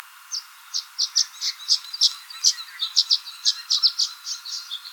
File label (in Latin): Tachycineta thalassina